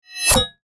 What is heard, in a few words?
metal whoosh hit; Aqua